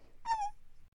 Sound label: Moan